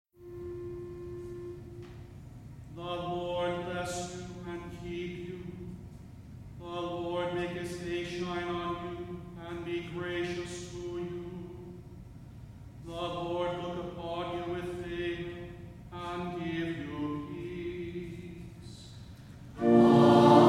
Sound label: Benediction; LSB 166